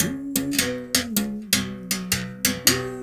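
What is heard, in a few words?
BS BERIM GRV, BERIMBAU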